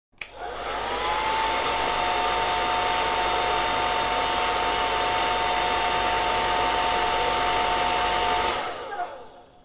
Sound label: machine